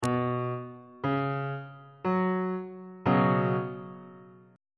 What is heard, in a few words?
g flat suspended 4th 1st inversion on bass clef